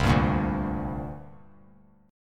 C 7th Suspended 2nd C down; C7sus2 strummed; JGuitar

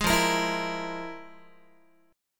F Minor Major 11th Guitar, chord; strummed; JGuitar

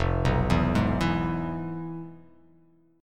F Minor Major 7th Flat 5th

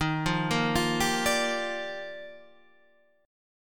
G Minor 7th, Eb; Gm7/Eb arpeggiated; JGuitar

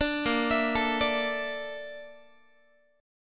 Bb Major Sharp 11th Bb down Guitar, chord; arpeggiated

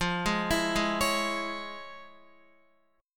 F Minor Major 7th Sharp 5th, F, Guitar; arpeggiated; chordshape; x,8,8,9,8,9

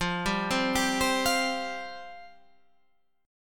F Suspended 2nd F

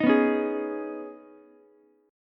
Db 7th Suspended 2nd, Ukulele; JGuitar

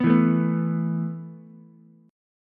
B 7th Suspended 4th B down Ukulele; B7sus4 strummed; JGuitar

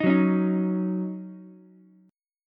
D sharp Minor 7th, Ukulele; strummed; JGuitar

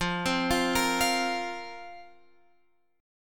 F Suspended 4th F down Guitar Open F; Fsus4; arpeggiated

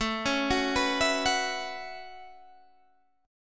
A 6th Add 9th A down Guitar Open D

